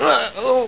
scream; wanted

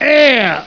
pain

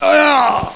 die

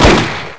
colts fire; wanted; weapons

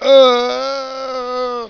scream

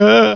pain; wanted

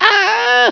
arrghh

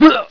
pain

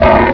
pain; wanted

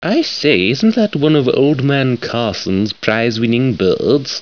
prize birds; wanted

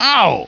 pain

